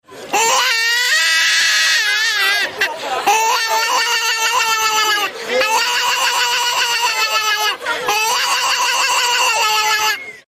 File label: sounding like an ill child vuvuzela baby